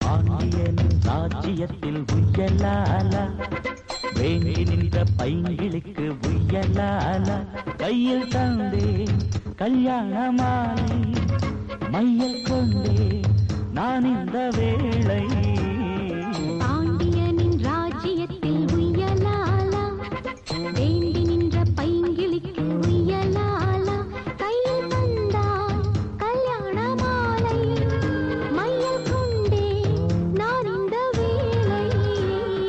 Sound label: Tamil Song Ringtone